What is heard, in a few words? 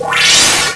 Heavy Voltage